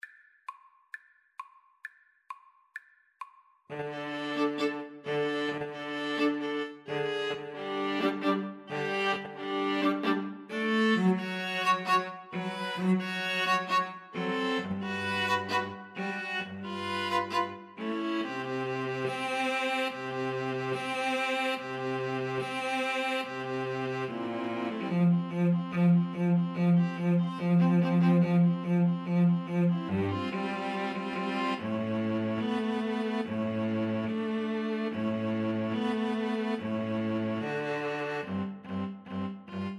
romanian folkdance, string trio

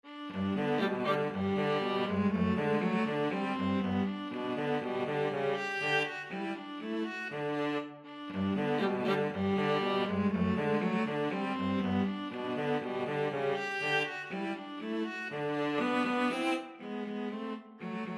viola cello duet

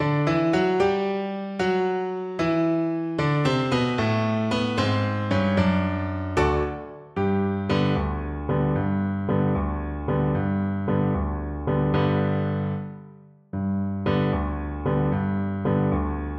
cello

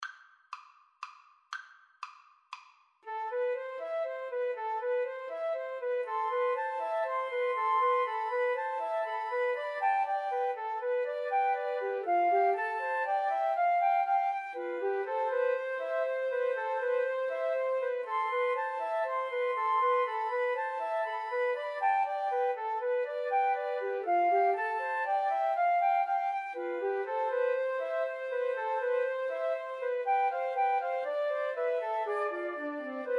Spanish Dances flute trio

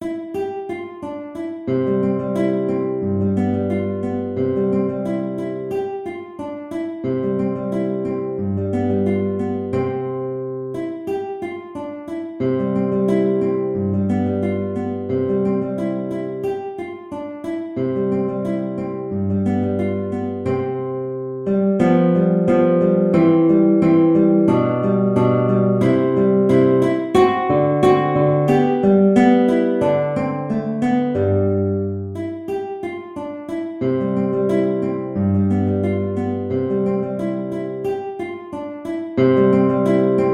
Allegretto in C guitar; Carcassi

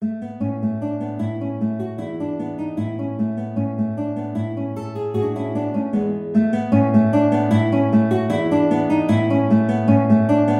Vivace, guitar

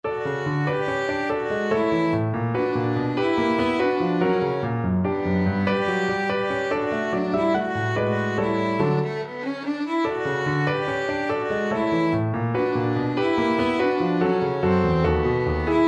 violin

